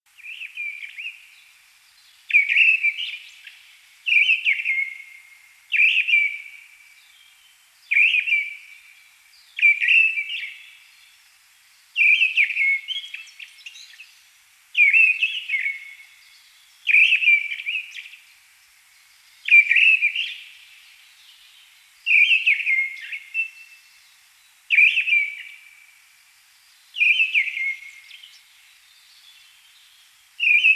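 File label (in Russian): deryaba golos, mistle thrush voice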